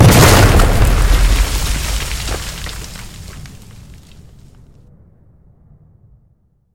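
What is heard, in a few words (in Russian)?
снаряд из гаубицы попал в цель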